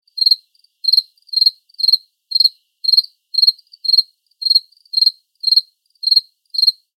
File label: crickets in the grass stereo; animals